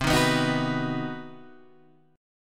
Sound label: C Minor Major 7th Flat 5th chord; CmM7b5 Chord {8 6 9 8 7 7} strummed